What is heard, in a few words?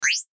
button digital twinkle; Multimedia; Buttons